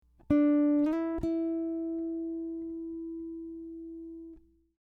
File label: shift slide up, guitar; slides on guitar